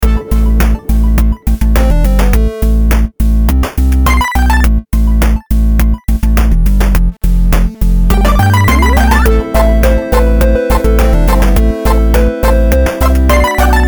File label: boss, bgm; asciinevrdie; act boss